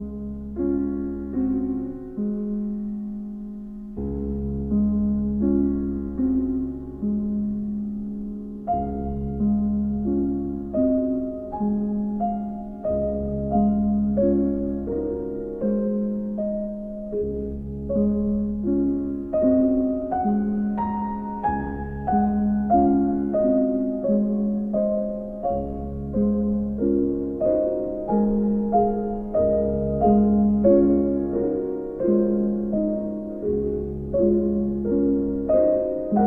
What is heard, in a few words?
piano, Berceuse In D Flat major; Garrick Ohlsson; Opus 57